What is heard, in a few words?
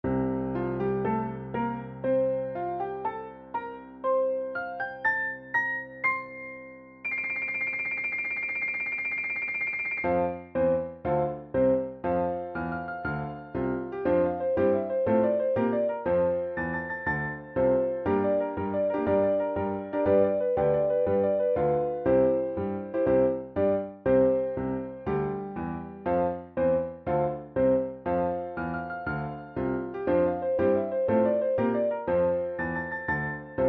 Victory Theme; nintendo64; Mario Kart 64